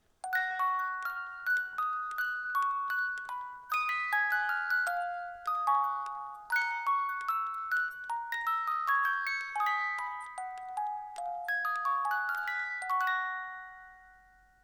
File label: Air